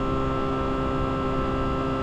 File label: Lexus LFA, INT, Designed, 8k RPM loop, 4.8 V10; stereo XY; Soundholder